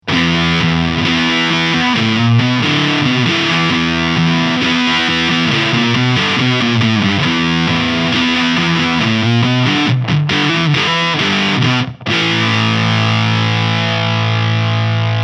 Foxy Tone Box Medium Gain; Warm Audio

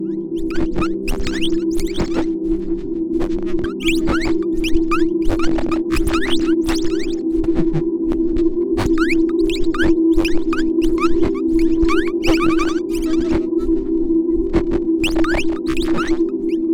Noises Glitch Beat; AudioThing Hainbach Bundle

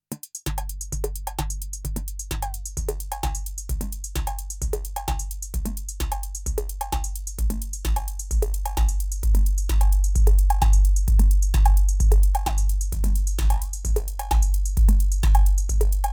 Erica Synths Black Hole DSP2 Servo Flanger

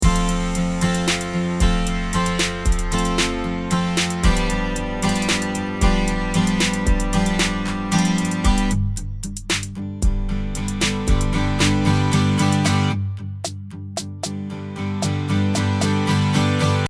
Strummed Accoustic; NI Kontakt

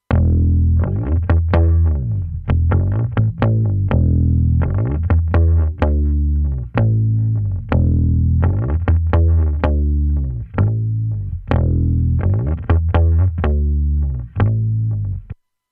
Bass Black Lion

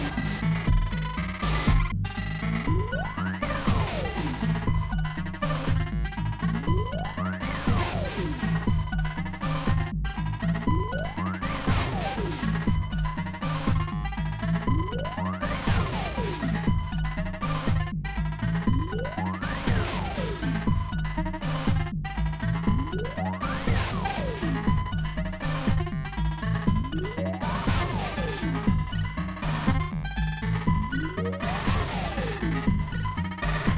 SpazeDrum; Lama

